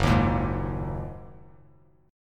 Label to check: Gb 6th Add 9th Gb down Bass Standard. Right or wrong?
Right